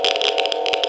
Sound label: geiger